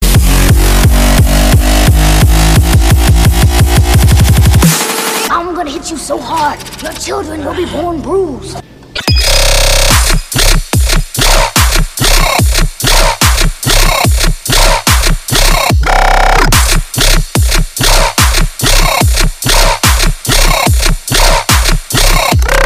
remixed

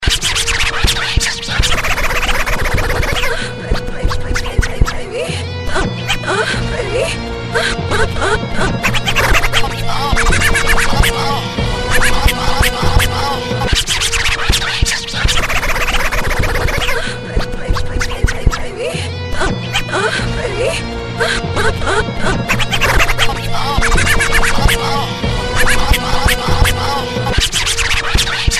Scratches

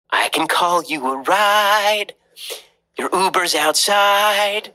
singing; Ben Shapiro

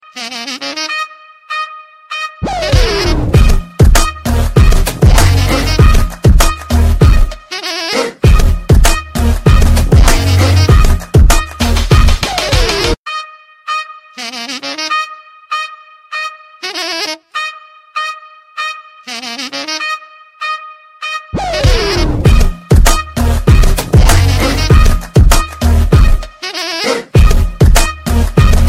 Rush Fukraa Beat

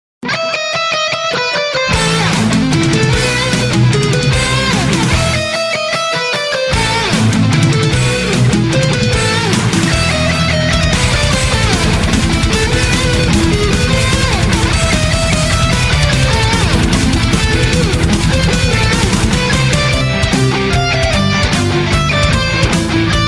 Metal Mix; Fur Elise

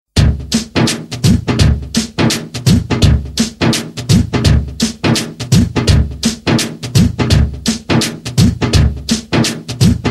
Drums

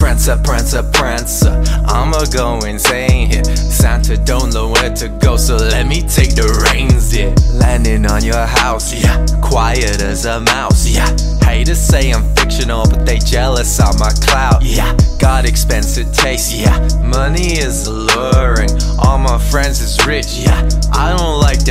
Rap; Prancer

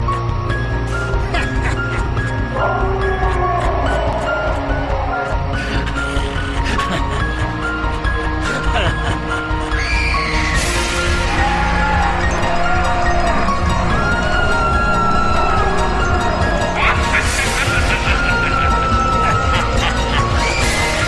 Horror

